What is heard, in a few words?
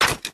plant, claymore; seal claymore